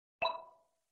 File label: button